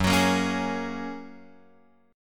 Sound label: F sharp Major Add 9th; F#add9 Chord {2 X 4 3 2 4} strummed